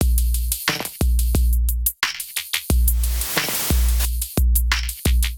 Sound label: Tokyo Glitch Kit groove 89 bpm; MIDI Clips; 89 - 120 bpm; Ableton; Glitch and Wash